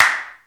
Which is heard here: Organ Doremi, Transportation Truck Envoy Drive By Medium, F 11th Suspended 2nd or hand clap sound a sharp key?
hand clap sound a sharp key